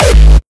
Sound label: Kick Dist Disarmed; Artinstitute